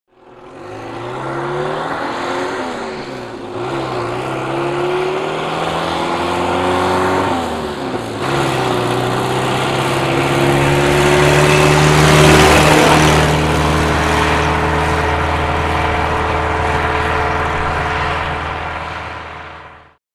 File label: Automobile; By; Austin Ruby Up From Mid Shot Passes Mic And Away.